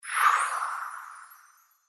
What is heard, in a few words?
Collect; The Lucky Fairy